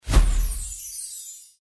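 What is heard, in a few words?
symbol disappear; Joker Slot; slot game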